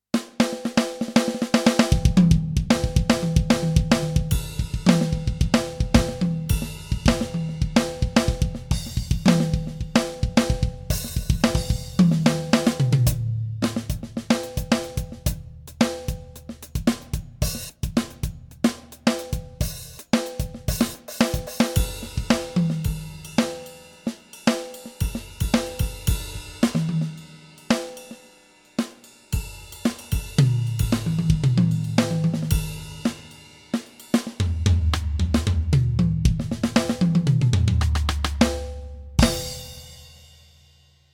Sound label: KORG MPS10 Funk Kit